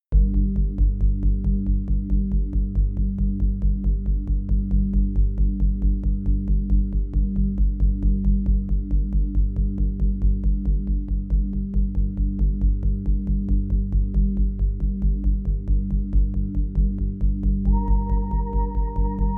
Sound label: sequence plucked bass